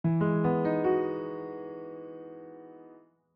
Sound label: Piano Without Haas